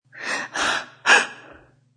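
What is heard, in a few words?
Female Quick Scared Breath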